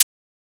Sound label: Closed Hat; FLPRO